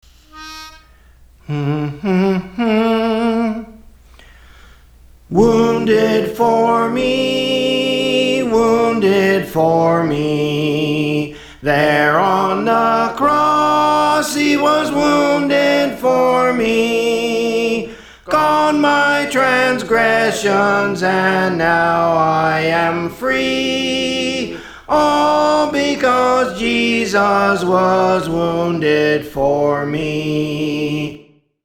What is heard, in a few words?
Soprano; Praise For The Lord Training